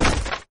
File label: fall; john carter; lost symbol